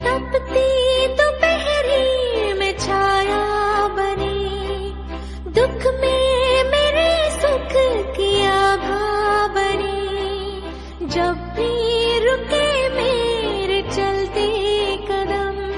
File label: Female Version Ringtone